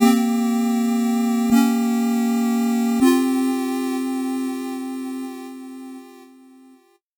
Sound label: 8bit jingle slow